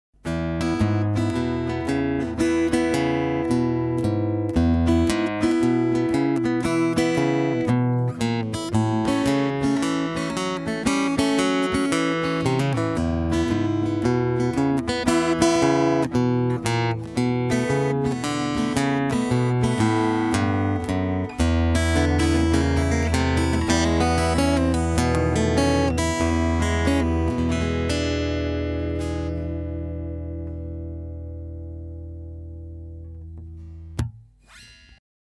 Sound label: blue sine